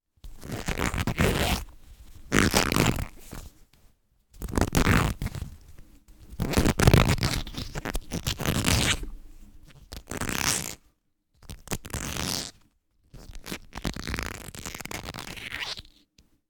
Rubber Handling And Squeezing

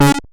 909 Eh Bleep

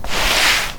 Scratch

